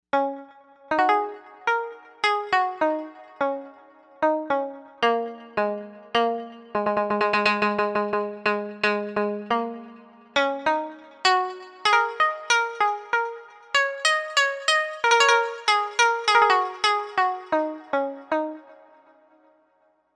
East Pluck, Novation UltraNova; Factory Patches

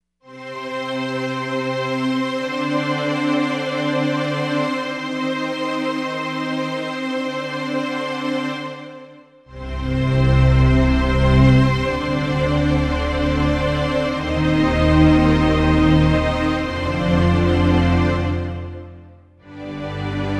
Film Octaves

